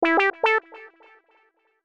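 original ringtones and notifications Samsung Galaxy S22 / S22+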